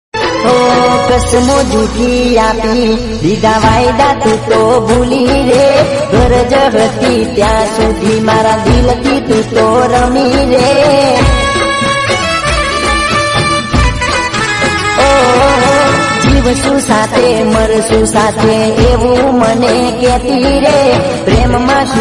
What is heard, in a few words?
Gujrati Song Ringtone